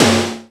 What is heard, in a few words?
P5 SNARE, Elec. Percussion; E-MU Producer Series Vol. 2; More Studio Essentials; Composer